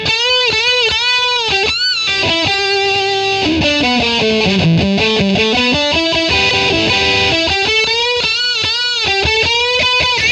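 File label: Les Paul microphone bridge, Bridge pickup, Канал overdrive Fender Frontman снятый миком behr xm2000s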